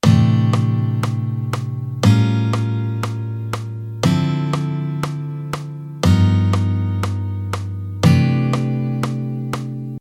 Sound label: Shell Voicing, jazz guitar